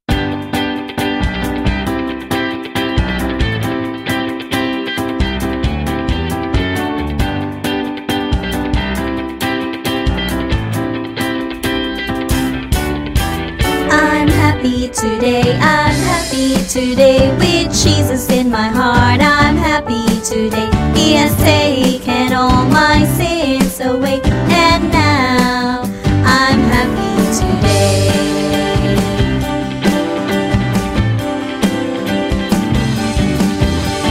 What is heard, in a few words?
HAPPY TODAY Kid Song Happy Song